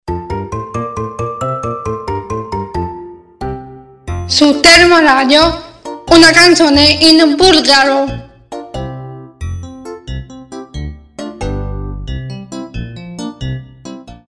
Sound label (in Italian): Canzone in bulgaro